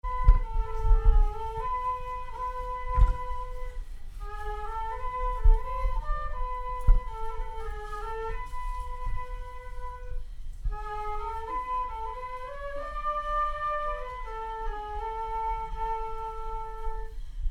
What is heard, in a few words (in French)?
plinn flutes; charivari